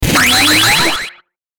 Ascend Distortion Burst Delay; Blastwave

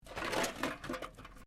Can Softdrink Rubbish Move; various metal cans; Materials

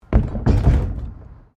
PLASTIC BARRICADE MOVE; Material components for constructing more complex sounds

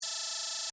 Device Readout, Scifi; Tech

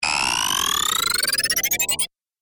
Robotic Stretch Sound; Robot Sound Effects

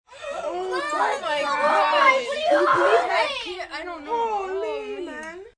Kids Gasp Shock, Gasping, group of children; VoiceBox

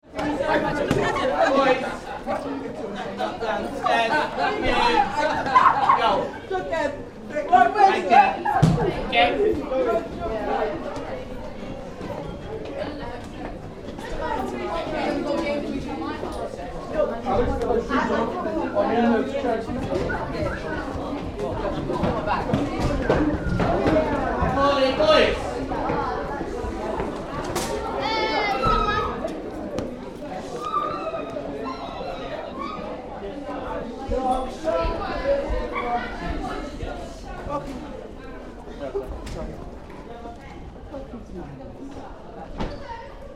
School Children Chatter at end of lesson; kids between lessons; Recorded walking through various school are; Tom Hutchings

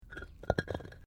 PAVING STONE MOVE, dragging; Material components for constructing more complex sounds; Dragging (Sound Effects:Objects)

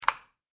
household switch light flick; Light Switch ( Wall Mounted ) Single Click; McKinney Sound

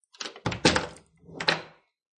Wooden Door Close And Lock; Miscellaneous Doors

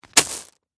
Foley, Plastic, Bag, Marbles, Set, Down; Miscellaneous Toy; BlastWave

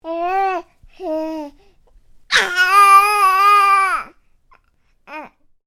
Baby: making vocal noises, happy sounding; Babies; Voice; Stuart Duffield

